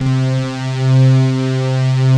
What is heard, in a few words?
SYNTHETICS, pads; Pro-Rec - Synthsonic; TR PADS